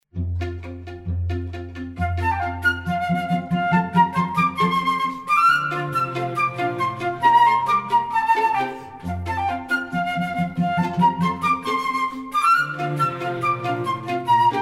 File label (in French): Tambourin; F.J. Gossec - Le Tambourin